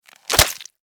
Newspaper Hit, Extermination, Newspaper, Hit, Squish, Insect, Bug; Sound Effects:Animals:Insects; Blastwave